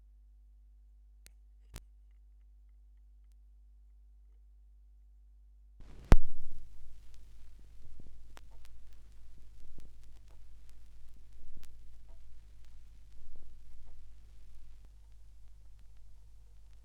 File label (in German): schallplattengeraeusche; rauschpartikel